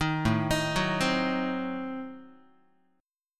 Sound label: A Diminished 7th A down Guitar; Adim7 arpeggiated; 6,5,6,4,4,x; JGuitar